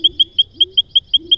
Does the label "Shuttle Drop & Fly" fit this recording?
No